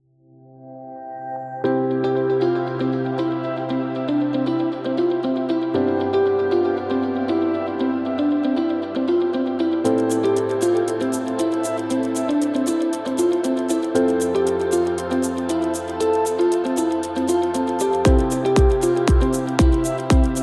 Medical Upbeat; positive vibe

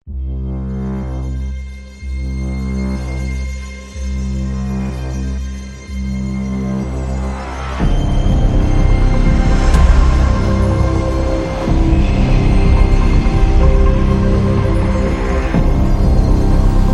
Crime

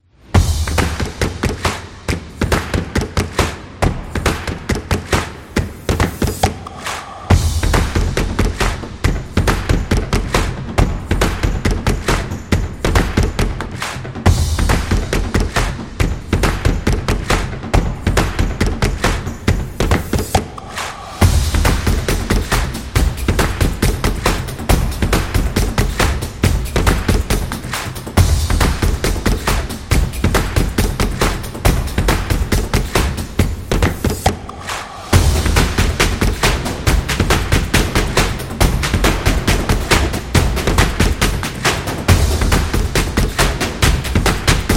Video Game, stomps power

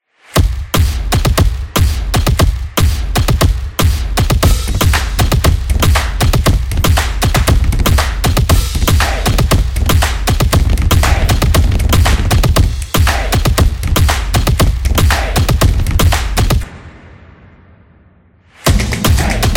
This Is Rhythm, percussive; Percussive Nation Vol 10

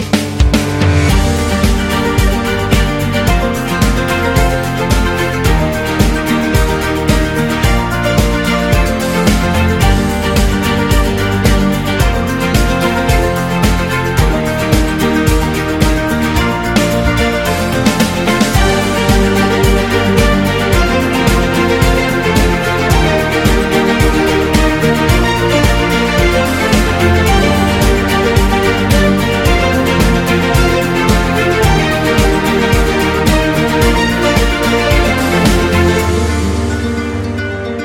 Inspiring Background

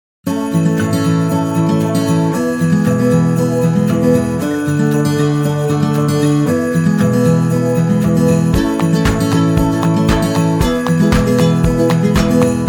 Upbeat Christmas Happy

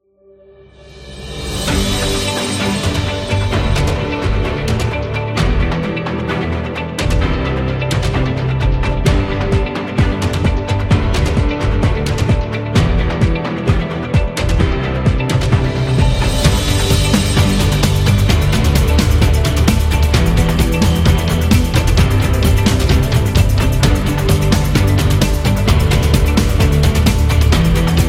Award Celebration; epic action

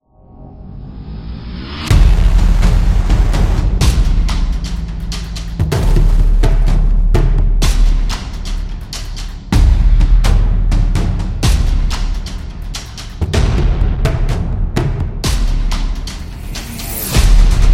War Horn; epic drum

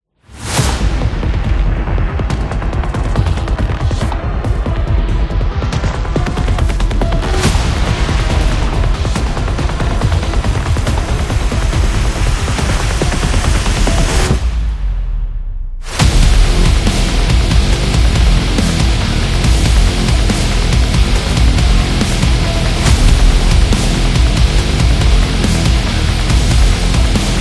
FPS Metal Doom

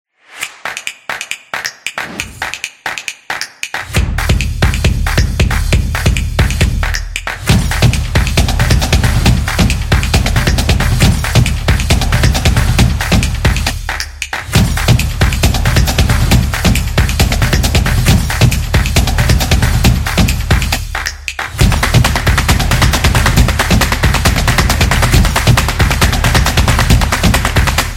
Drumming

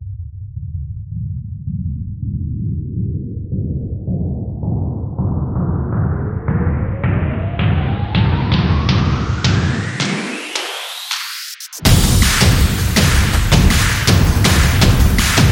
Football Hit, percussive; percussive nation vol 3

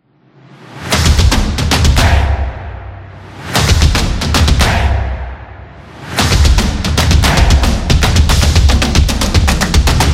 Stomp Music

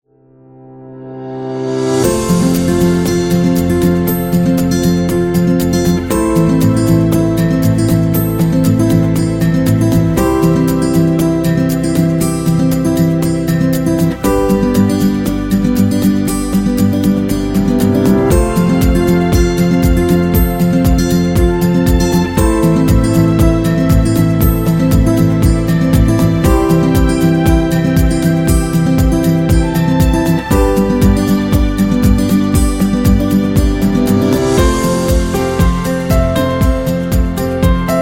Optimistic Guitar

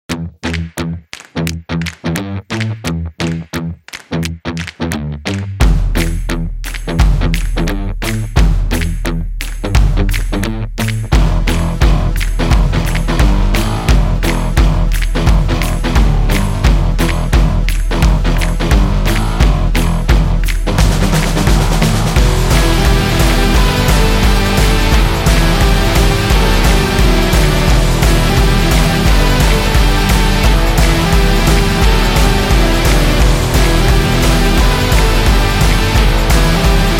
This Is Indie Rock Upbeat

